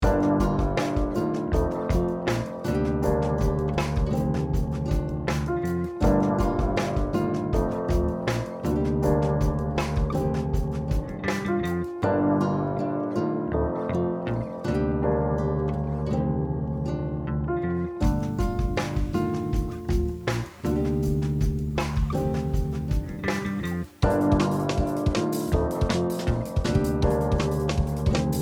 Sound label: decapitator test moto